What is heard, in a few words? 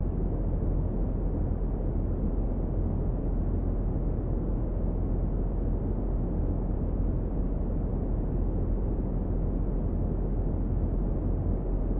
train passing